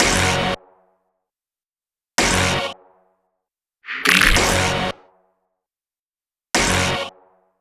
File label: Brass, 110, F-m, Dry